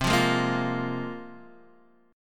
C 7th Suspended 4th Sharp 5th, C, down, Guitar, DADGAD